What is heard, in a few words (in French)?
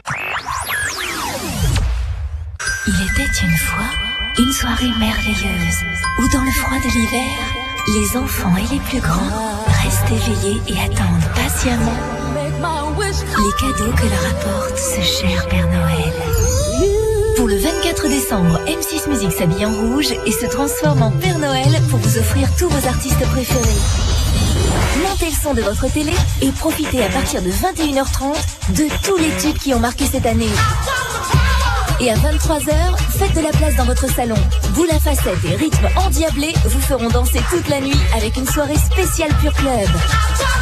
jingle noel